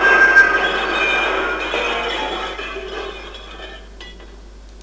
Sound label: glass; bbc